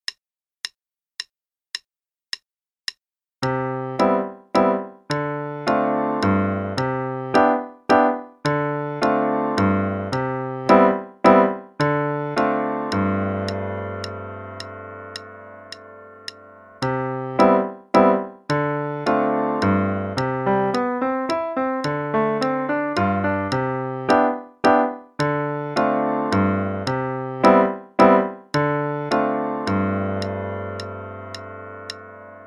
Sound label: Ferris Wheel Improv (qn=108); Level 1; Faber Improv